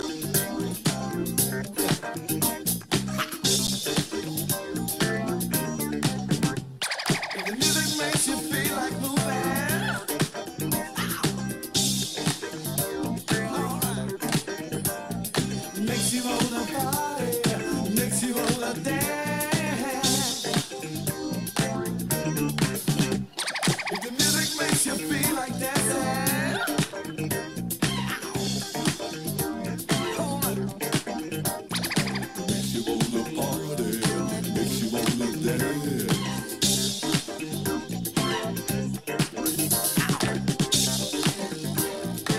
brass

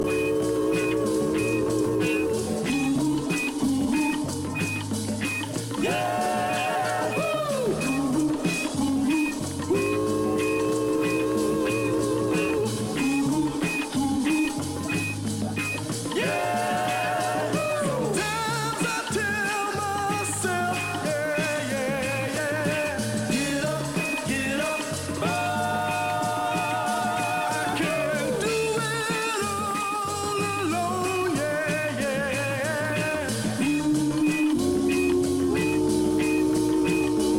FUNK BAND

